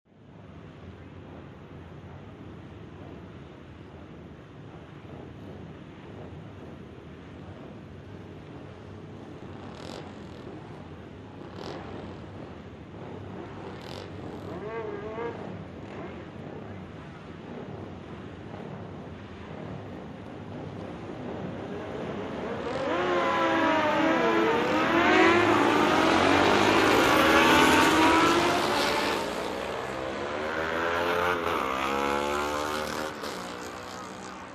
Motocross, roar of engines, regassing, start of races; motorcycle racing